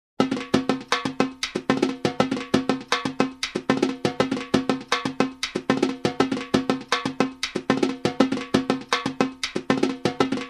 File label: repique